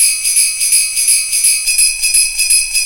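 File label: PERC LOOP, percussion kit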